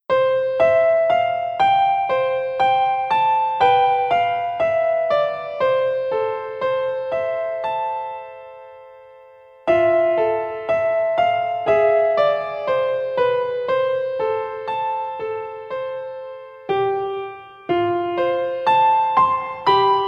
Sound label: Piano